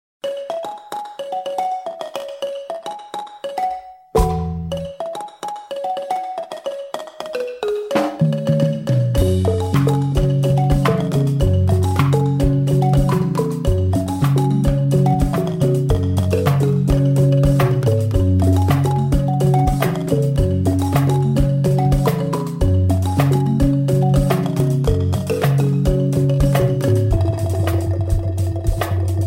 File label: balafon